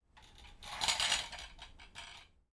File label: crossbow set down slide on linoleum floor